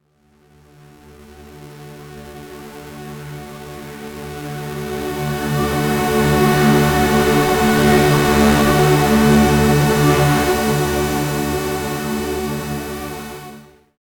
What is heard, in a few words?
big space cyberclub, club; ps5